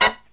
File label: HIGH HIT, Brass Riffs; USB Soundscan vol.29 - Killer Brass Riffs; AKAI; HIGHHITS